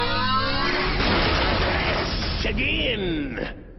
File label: crash